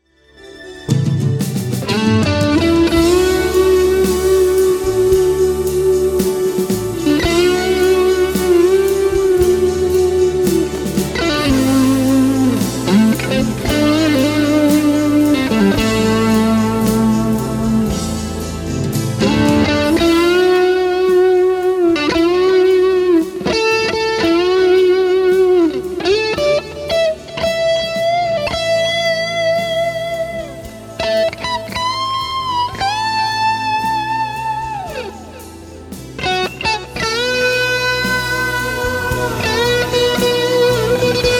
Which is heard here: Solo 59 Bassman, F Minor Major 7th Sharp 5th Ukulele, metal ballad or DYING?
Solo 59 Bassman